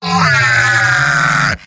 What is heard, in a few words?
yell; heavy; mvm